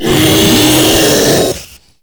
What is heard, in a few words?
Proto Infested Ancient Vocal Attack; Warframe